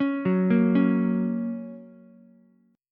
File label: Gb Major, Ukulele; Gb arpeggiated; JGuitar